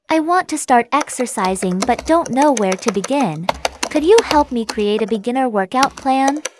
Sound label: Typing; healthcare